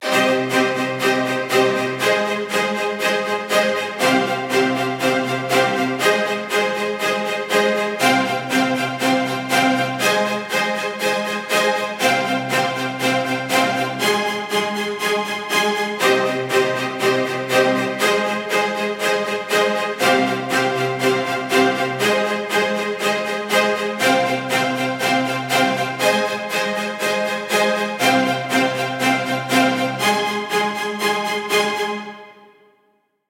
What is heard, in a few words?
Full String Section Staccato - Cm 120BPM; Construction Kits; STEMS; GhostHack; Ghosthack - SkyRealm; Kit 12 - Ravensthorn Manor